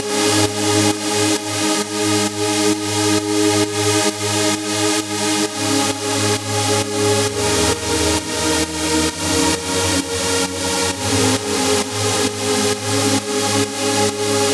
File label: Full Pad WET, 132BPM, G# major; Vengeance Samples; Jericho Kit